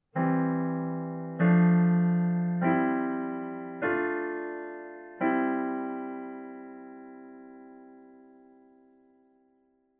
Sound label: Gospel harmonization using ii7